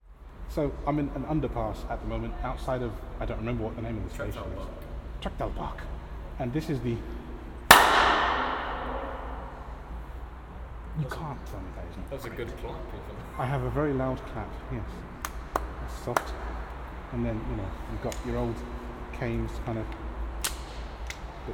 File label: Underpass Reverb